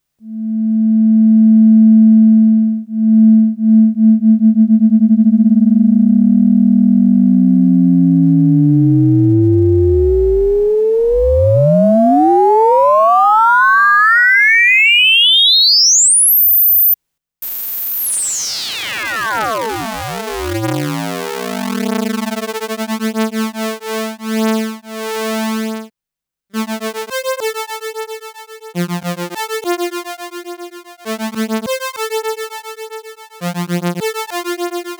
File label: vc lfo